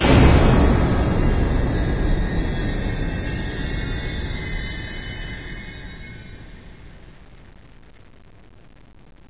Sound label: boo